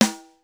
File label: rim 2 cntr; sr16 sd